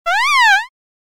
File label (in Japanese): モンスターの鳴き声 モノラル